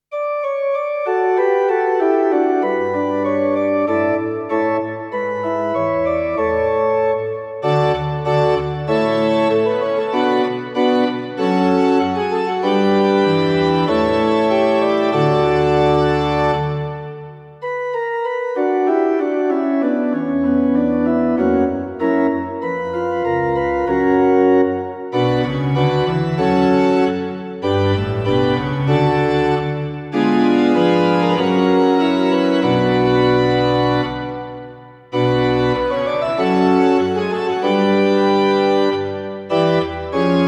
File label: chapel and church organ prelude intro in D major